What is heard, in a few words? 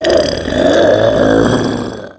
hunter stalk, zombie vo; Left 4 Fortress